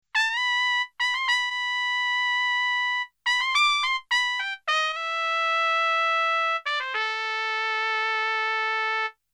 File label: pitchbend